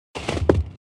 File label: Rolling